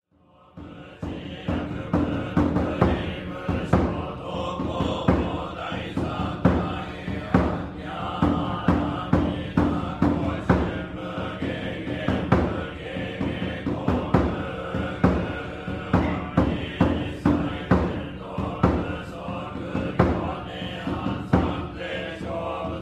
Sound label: NOISE